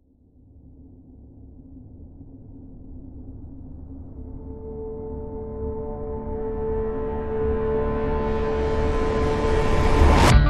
No Melody

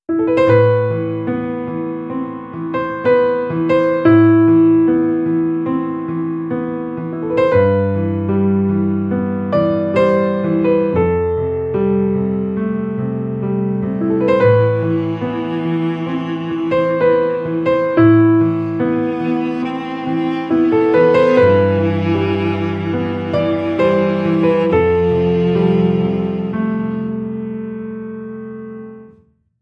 Intimate

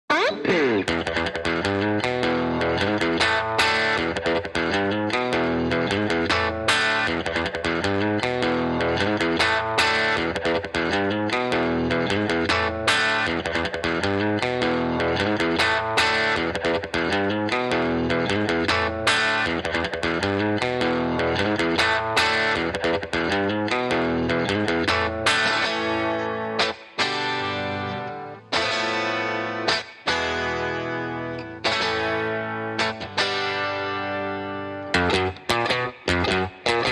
Guitars Only

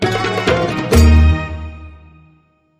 Good Oud, Hit

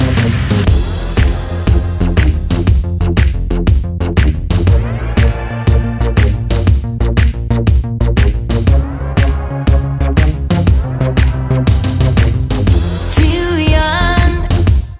Out Pads

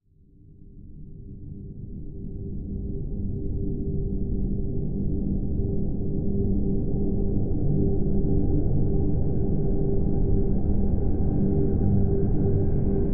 Drn Delusion; Drn Delusion G

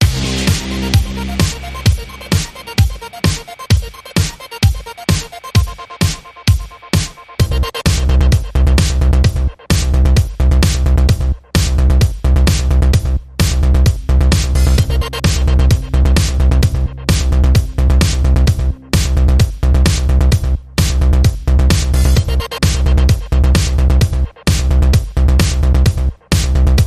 Bed Mix

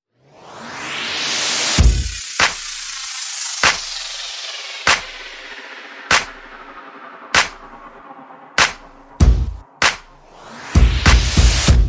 Perc and FX

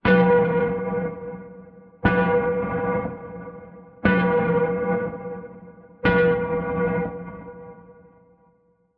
Sonar Warning

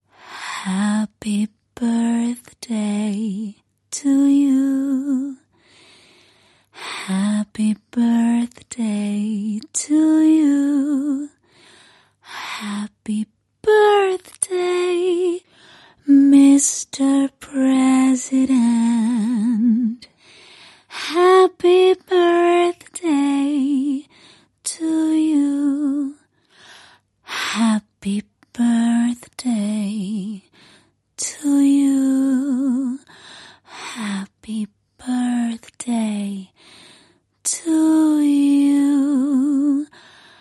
Sexy Solo Female Happy Birthday

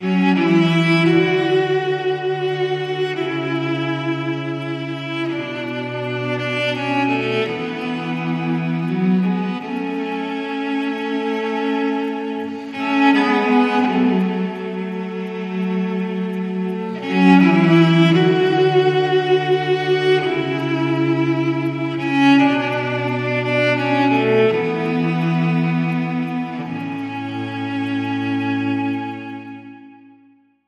30sec Cello

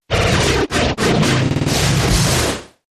Big Chaos

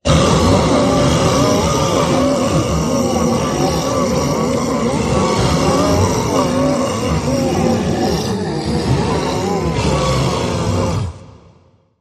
Many Zombies

